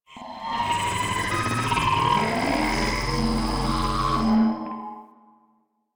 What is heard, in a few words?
Resonant Energy; Ambience; Warframe; Corpus Railjack